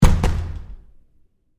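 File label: Door Bathroom Stall Close close-up Lite; Household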